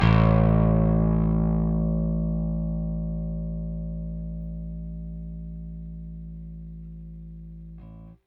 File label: Rickenbacker Bass; Airwindows